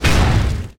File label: attack effect